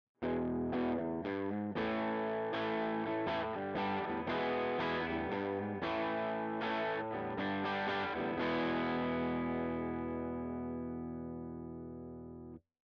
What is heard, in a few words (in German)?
ToneX Pedal als Interface